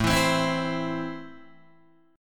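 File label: A Minor, Guitar DADGAD, chord strum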